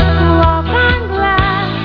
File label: GLASS; Auto